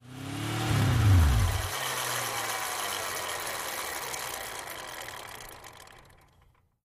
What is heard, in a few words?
Motorcycle, Kawasaki 750, Drivewheel Free Spin To Stop